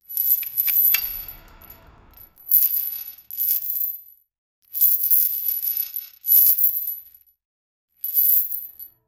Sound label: soft gui open sfx light